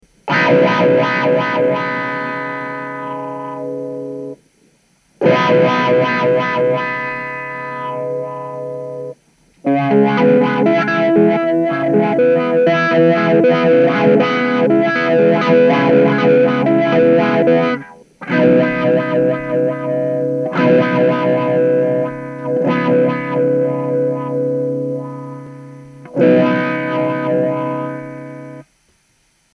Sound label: wah